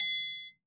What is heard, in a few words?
triangle; TRIANGLE2